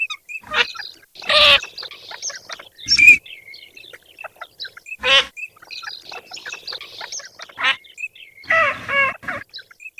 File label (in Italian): ALZAVOLA